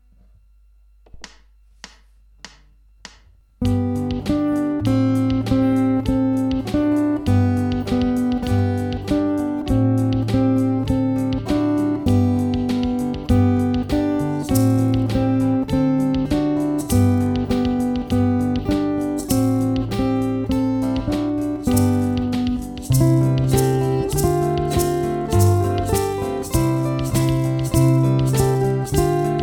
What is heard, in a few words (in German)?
kleine melodie